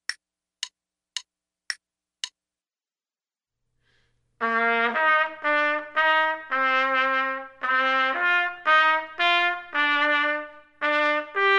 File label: trumpet; Selected Duets for Trumpet